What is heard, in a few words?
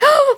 voice kid hit